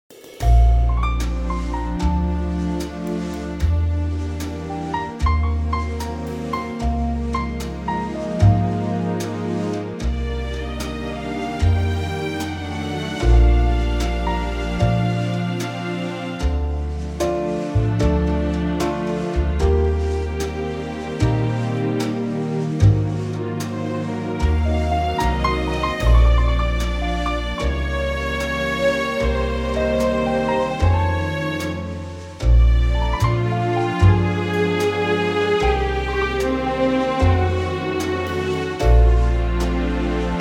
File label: orchestral; key - Bb - vocal range - Bb to D; Dreamy orchestral arrangement